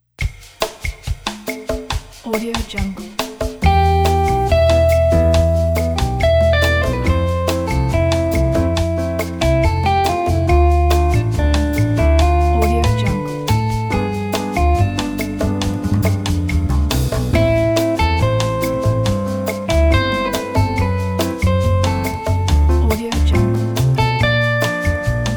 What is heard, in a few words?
bossa nova; neonrecords